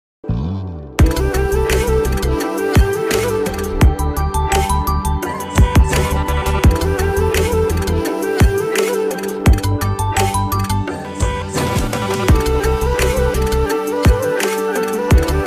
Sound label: nepali song